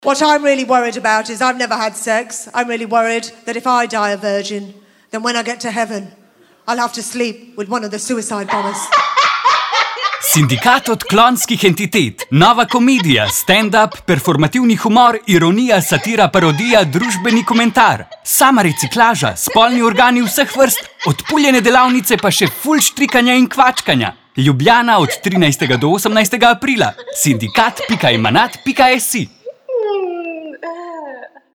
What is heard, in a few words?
jingle; sindikat 2019; emanat